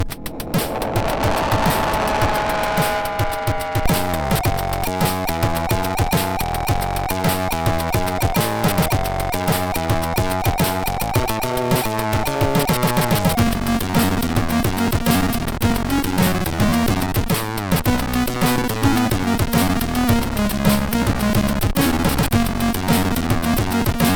Jingle Bells Funk, MOS6581R3

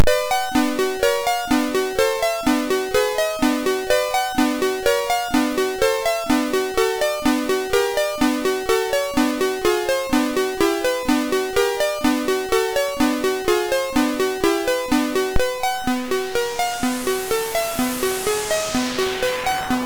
Game Music, sid MOS6581R3

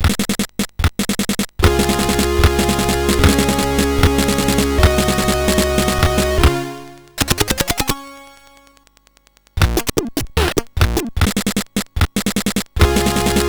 sid MOS6581R3; Bergmann Christoph

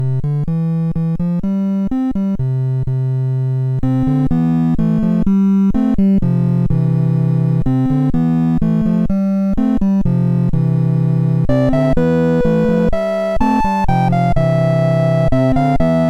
Oldskool Song, sid MOS6581R3

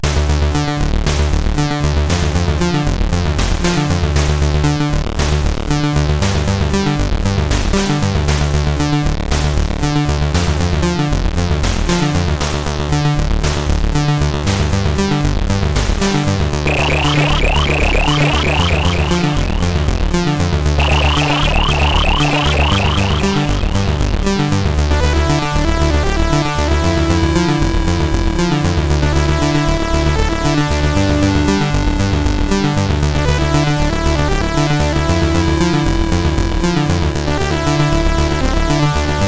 Party Song, CSG8580R5

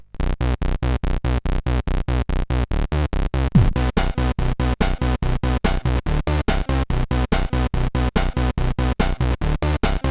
sid CSG8580; Crash; hvsc

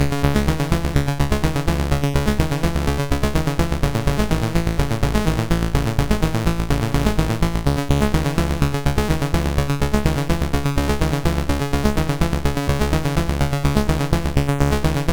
sid, MOS6581R4; Heli Jagd

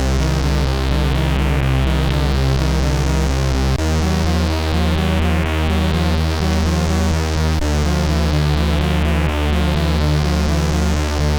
sid CSG8580; HVSC; Cadavre

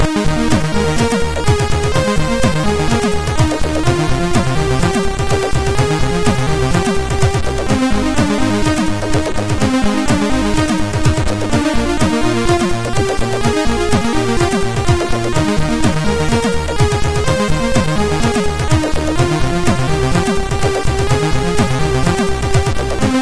Outrun sid MOS6581R3

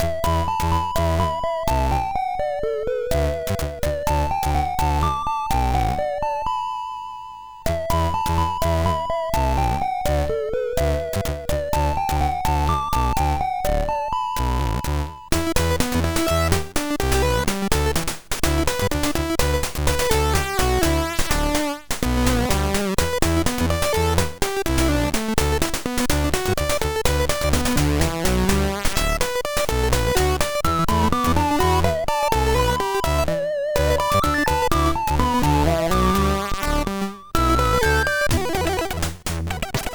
Bzzz sid MOS6581R4

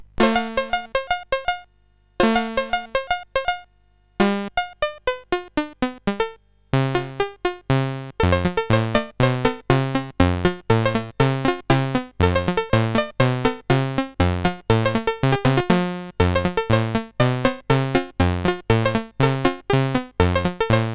Boogie Rag, sid CSG8580R5; Master Composer

